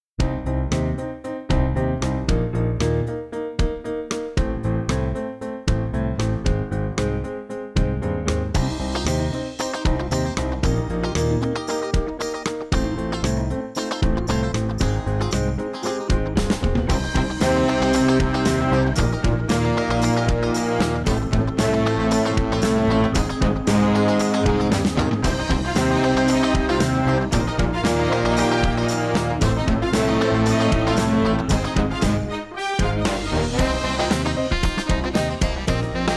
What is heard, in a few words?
Happy Jazz